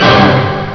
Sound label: Orchestr; DX-Ball